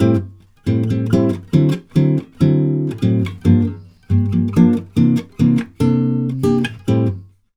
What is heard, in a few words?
CHORDS, Brazil Bossa; USB Soundscan vol.16